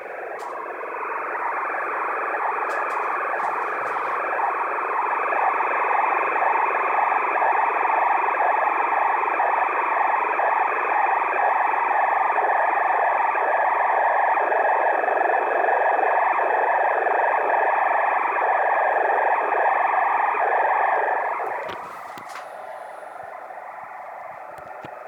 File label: six meter noise